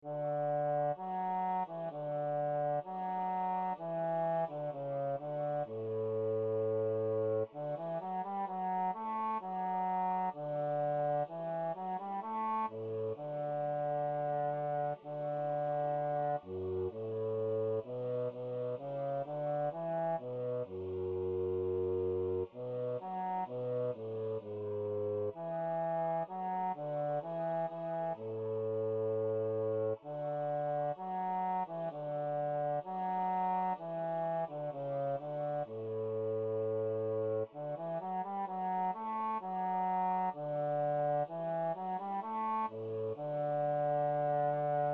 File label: hero bass